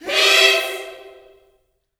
PEACE CHD, Vocal Gospel; Spectrasonics; Vocal Planet Vol.1 Gospel; akai

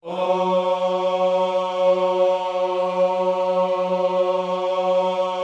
OHS F#3, Gospel; Spectrasonics; Vocal Planet Vol.1 Gospel; akai

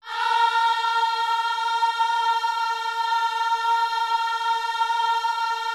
OHS A#4, gospel; Spectrasonics; Vocal Planet Vol.1 Gospel; akai

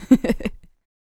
LAUGHS, Jazz Fx

